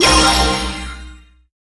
click gacha

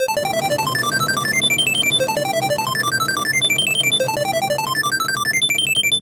roulette spin

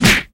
hit body punch

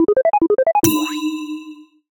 board question

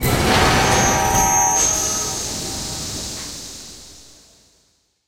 stage open; spaceTrain

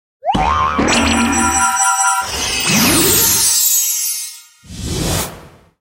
gacha ball

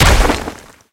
click guild

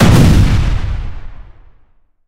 rocket hit

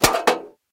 gear remove

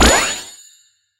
energy upgrade

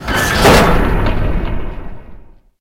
open

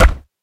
click ui wood

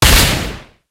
hit

